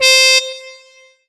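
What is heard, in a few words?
Kawai K5000W Mute Trumpet C5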